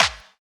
dry clap c key, hand clap sample tuned to the C note. Loudest frequency: 2899Hz; percussion; single hits one shots